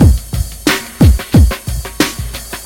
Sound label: Hip Hop Drum Loop